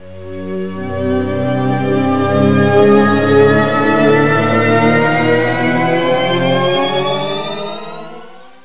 up pad; meetmediaband

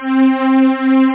string; OctaMED SoundStudio V1; strings

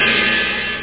the cymbal; Amiga 8-bit Sampled Voice

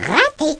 missed; worms final 3; FRENCH

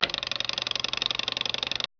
slider

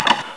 Kachunk; 3D Ultra Pinball Demo

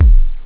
bumm, drums; OctaMED SoundStudio V1

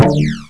space harp; Omnibus

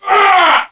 SWORDHIT; Renaissance

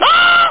Waaaaaah; RevengeAGApal; DeathFX

